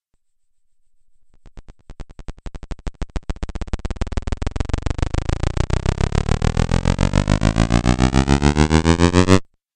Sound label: Rising Video Game; Video Game Sound Effects